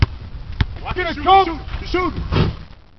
Basketball Game; Cheesy Lo-Fi Sound Effects

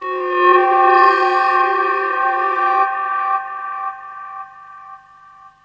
howling synth; Various Strange Sounds and Effects

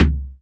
SC40 BD, Pearl SC-40 Kicks

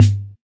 thick bap; Hip Hop; Hip Hop Specialty Kit; style kits

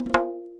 arabic perc, Arabic Percussion Kit

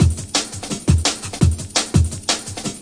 170 round kicking, drum loops and breaks; 161 to 180 bpm

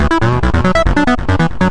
140 bouncing stellar acid line; SYNTH AND ELECTRONIC LOOPS

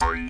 jawharp E2 oy; WORLD

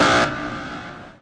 blart, synth

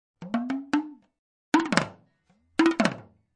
drum; REMIXABLE COLLECTIONS

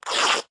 cloth rip; Cartoon FX

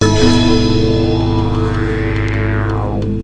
seventies suspense; Various Strange Sounds and Effects

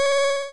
8bit kit powerup; LO FI

